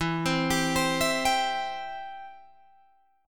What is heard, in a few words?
E Minor Sharp 5th E down Guitar Open G